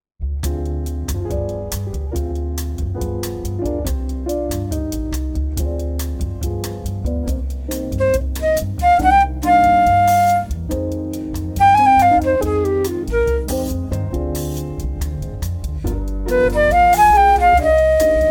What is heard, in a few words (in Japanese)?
休符 ドミナント 7th